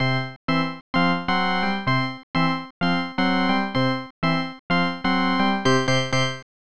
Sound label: Stadium Organ; ESPN National Hockey Night; snes